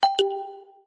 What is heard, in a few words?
xiaomi redmi k70 notification